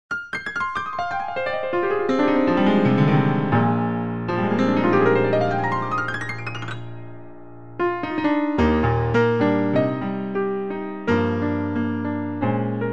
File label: Mega Piano